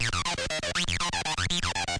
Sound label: 120 aggressive mono synth; SYNTH AND ELECTRONIC LOOPS